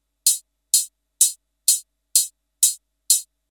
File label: hihat